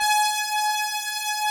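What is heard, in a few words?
synth horn G#5, Prophet 5; Greytsounds VOL-1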